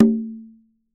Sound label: CONGAS; Q Up Arts; Denny Jaeger Private Collection